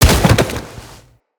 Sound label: Hoverboard Ragdoll Bodyfall; Vehicles; Venus; Hit Effects